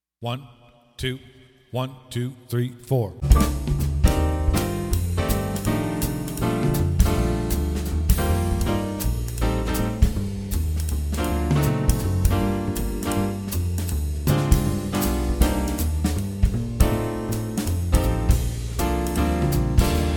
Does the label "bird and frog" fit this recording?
No